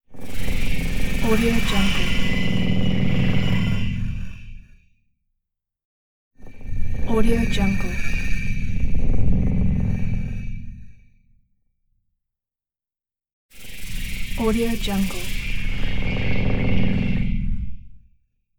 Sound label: rattling swirl swishes